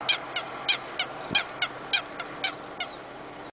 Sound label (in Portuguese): canto da carqueja de bico amarelo